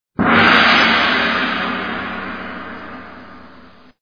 Oriental Gong

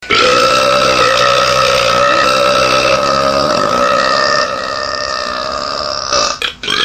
Berp

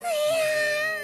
Cute Noise; Shiro

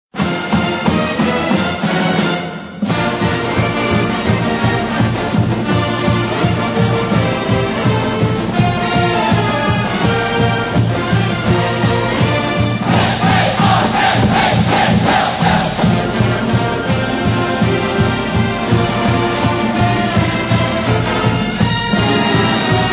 fight song; Marshall

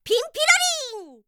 Ping-pi-ro-ring

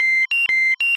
F18 Master Caution

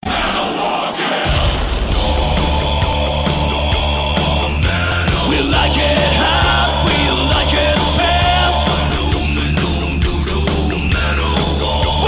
Metal

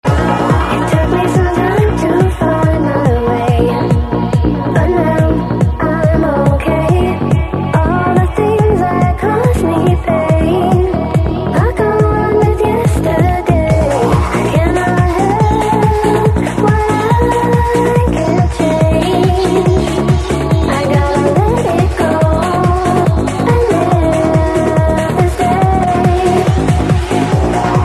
Guitars crash

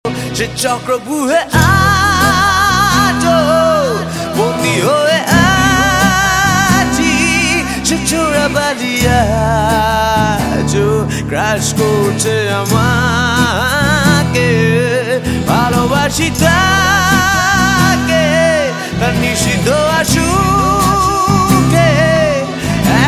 Unplugged

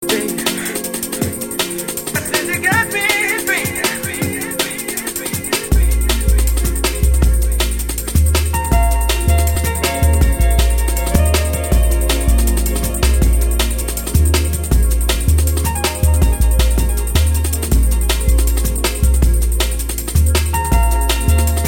Drum And Bass

